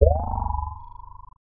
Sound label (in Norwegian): Ring dunk